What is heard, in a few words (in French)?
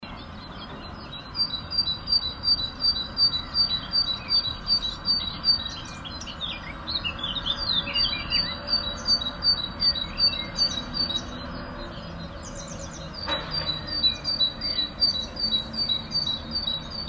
Oiseaux semaine, Communication animale